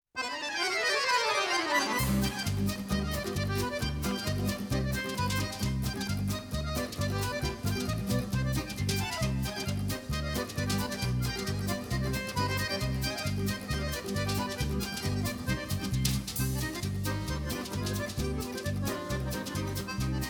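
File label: Karelian-Finnish polka; Sakkijarven polkka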